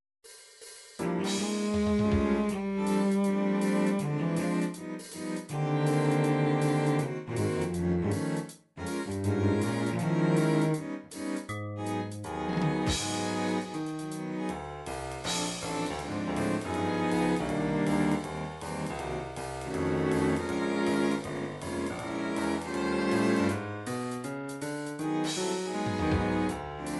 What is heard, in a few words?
bass, midi; Song Book